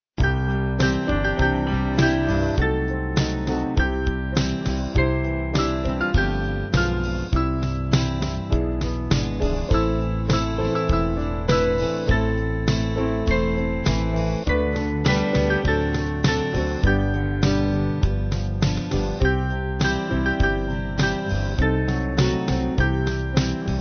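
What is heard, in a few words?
Band, Music Backing; Wonderful Grace; Lillenas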